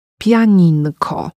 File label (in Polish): pianinko